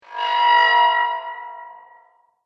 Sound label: Alien Warning Sound